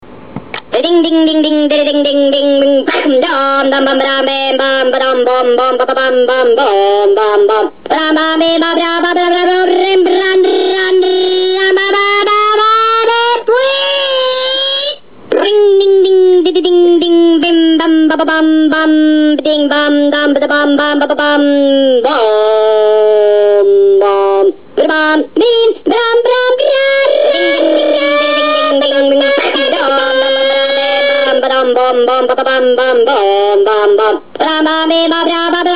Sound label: ringadingdingding